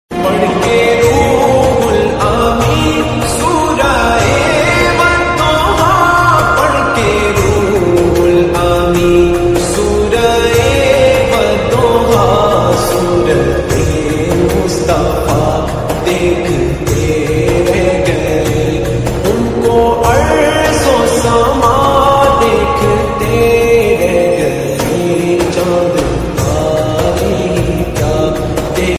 naat ringtone beautiful islamic ringtone islamic naat ringtone